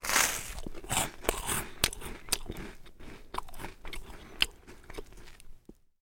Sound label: eating toast food crunch breakfast chew mouth